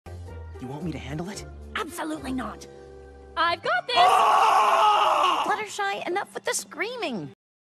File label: screaming